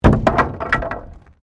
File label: Wood Fall